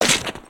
Skullken Walk Run; creatures organic

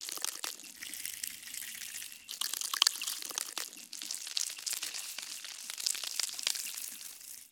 Spider Boss Voice Loop; creatures mechanical

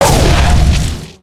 Dragon fireball, creatures organic

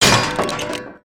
Metal Object; destructibles

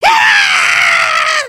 freakout; emotes

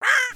Parrot Squawk; creatures organic

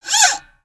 Dragon In A Box Springy; destructibles

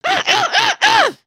Angry; emotes

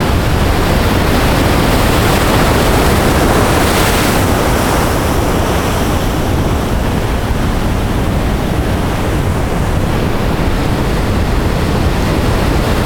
Waves; aronwk collection; ndaudio; ambience pet rock

